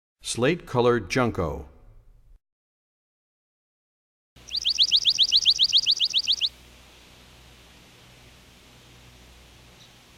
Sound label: Slate Colored Junco; Animals; Birds